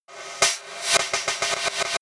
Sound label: reverse hit snare roll